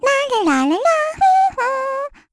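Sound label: "Hum", Korean; heroes; Lilia; kings raid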